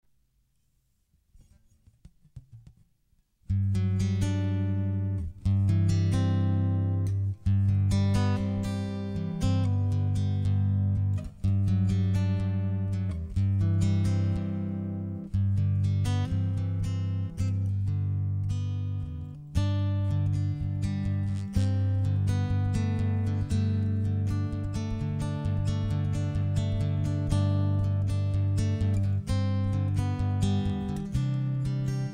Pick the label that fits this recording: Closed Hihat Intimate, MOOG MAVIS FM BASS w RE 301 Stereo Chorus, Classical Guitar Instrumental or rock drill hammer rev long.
Classical Guitar Instrumental